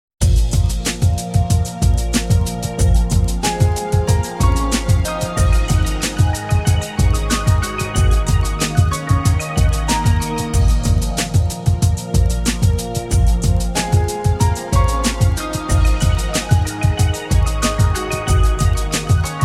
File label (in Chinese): 宣传片背景音乐